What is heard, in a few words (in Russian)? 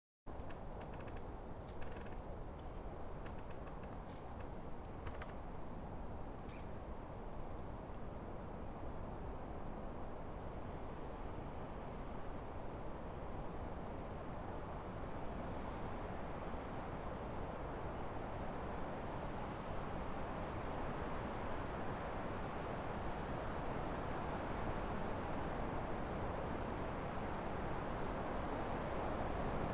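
звуки зимнего леса со скрипом веток от ветра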